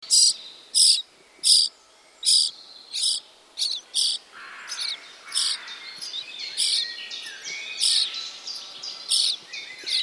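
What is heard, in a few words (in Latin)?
sturnus vulgaris, Głosy szpaków - nagrania własne; Pisklę szpaka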